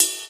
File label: soft hitwhistle; osu!